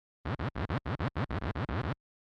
revert move; baba is you

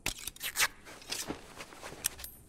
communication sound crafting

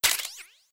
equip; Metal Gear Solid 4; gamesoundopedia

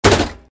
slot spawn

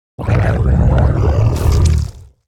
zerg variation; starcraft ii 2010

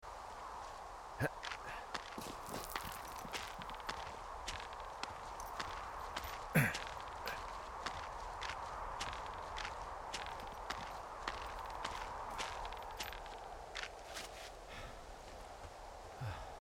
player climbing rope; firewatch

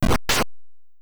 enemy gets hit by boomerang; 8 eyes

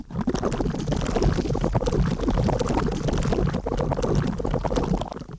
mud mix; Where is my Water

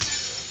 collect stones; kena bridge of spirits

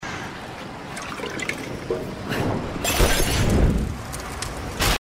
throwing molotov; rise of the tomb raider; lara

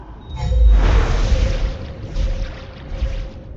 crystals; kena bridge of spirits

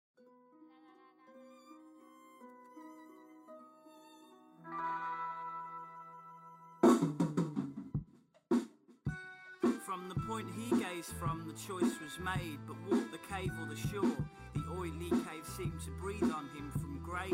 singing room music; Life is Strange 2; gamesoundopedia

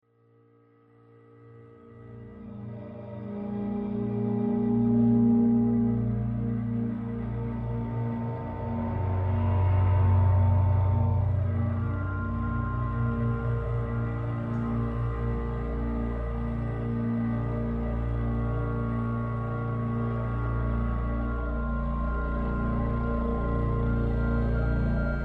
soundtrack sample

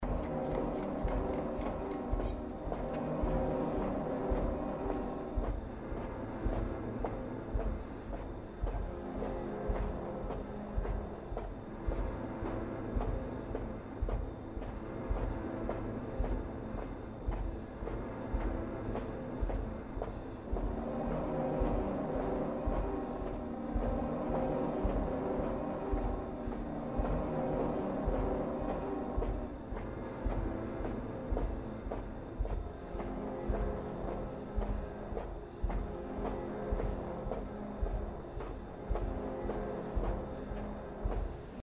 secret ending tones; inside

